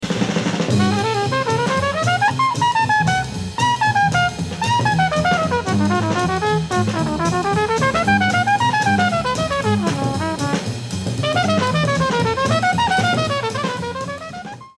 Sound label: solo